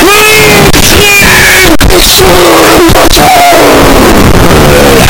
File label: epic pixel battle